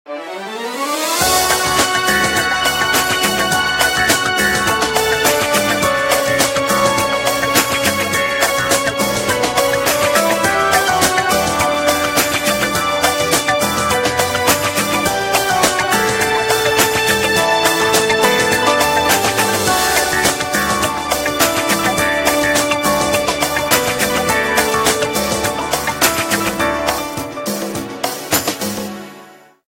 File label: Disco Party, Ringtones; Samsung SCH-W770